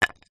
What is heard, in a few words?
wood chunk small light set down on concrete; foley; zapsplat